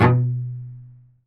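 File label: B1 SPICCATO, CELLO; Northstar; New Gold VOL-2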